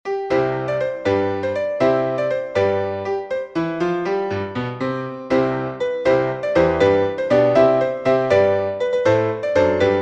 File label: roast beef piano